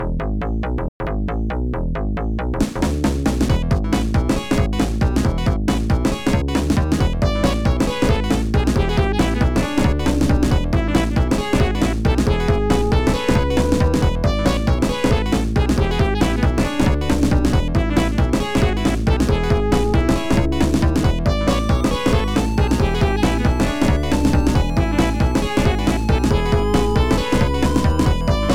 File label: Bonus Stage 1 (Pinball Machine); Sonic the Hedgehog 3 plus Sonic and Knuckles; Sega; Megadrive-Genesis